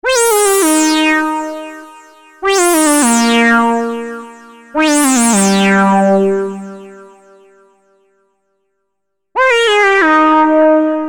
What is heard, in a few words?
Lead